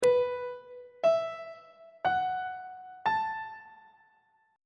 a double sharp dominant 7th suspended 4th chord intervals on treble clef